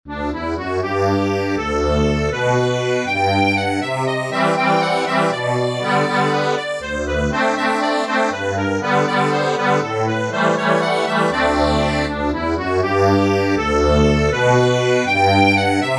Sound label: accordion, sheet music